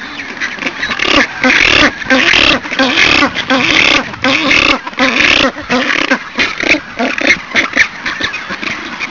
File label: rockhopper penguins, Antarctica